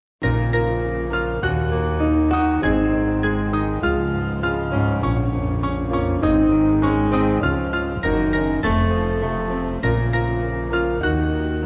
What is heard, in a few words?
Piano